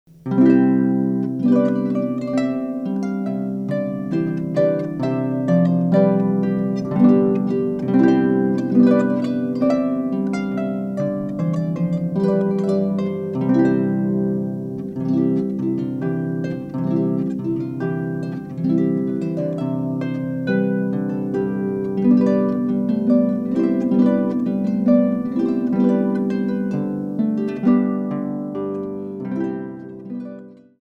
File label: Trumpet Voluntary